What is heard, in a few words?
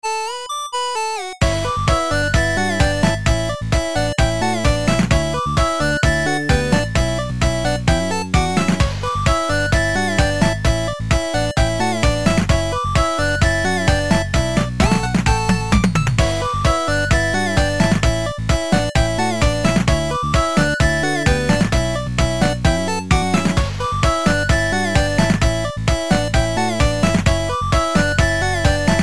Food Wars 8-bit